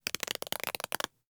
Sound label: cloth rip halting